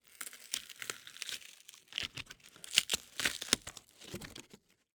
Cardboard, honeycomb, crush, twist, pop, shuffle, release; Eiravaein Works; Vaeyan IV